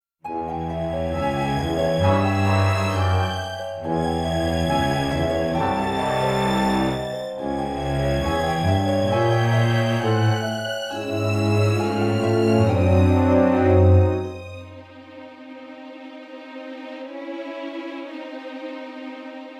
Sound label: Music Time Hole; Costume Quest 2